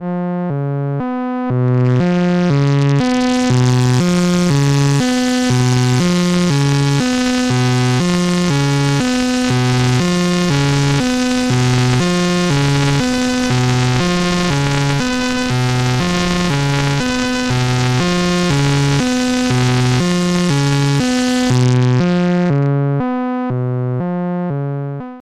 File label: Sine Dry Wet 50 Octave Triggers Voltage Sweep; Erica; Fusion Plasma Drive